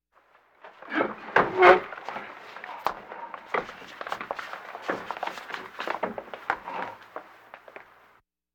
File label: Walking Machinery Trucks